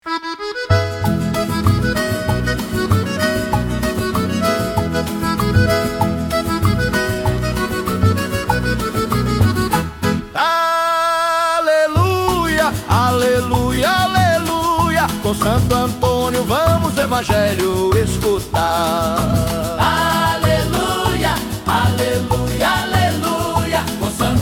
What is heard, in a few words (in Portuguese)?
aleluia aleluia aleluia